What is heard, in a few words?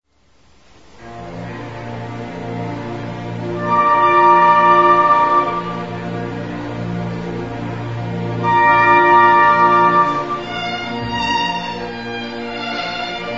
Violin 2; Dvorak Symphony No 8